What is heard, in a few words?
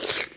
Suck